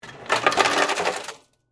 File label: Ice Machine Dispense, Hotel; Miscellaneous Bar Sound Effects